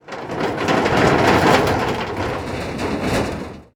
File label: explosive objects; World of Tanks